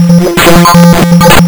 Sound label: lsdj waves; wavetables